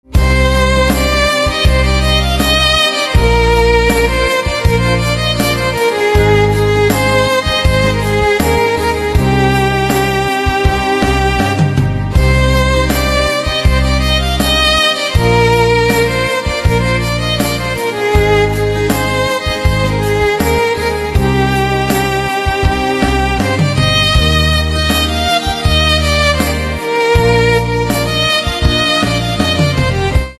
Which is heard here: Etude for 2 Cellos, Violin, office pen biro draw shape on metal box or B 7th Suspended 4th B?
Violin